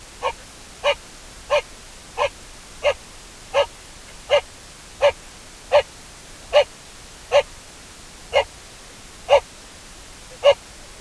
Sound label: Procapra gutturosa male bark; Mongolian gazelle; Sequence of male rutting barks